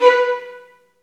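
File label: STR, Roland String Master Series, Violins Marcato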